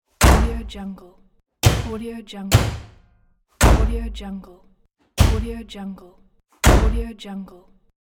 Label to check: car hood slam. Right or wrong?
Right